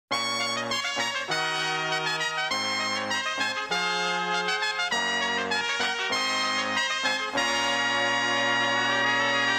saxophone

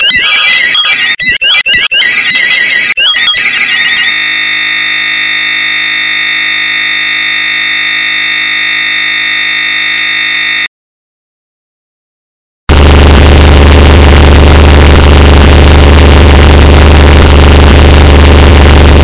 the hammer drill

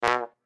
trombone

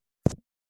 mic punch

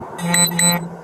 beep; axon 3

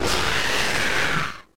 jumpscare sound; one night at scars 1

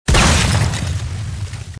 iceball shatter

